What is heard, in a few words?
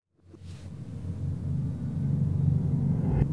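Reverse Suspended Mute; Cinematic Metal Impacts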